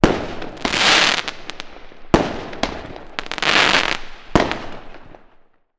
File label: fireworks multiple explosions fizz; sound effects one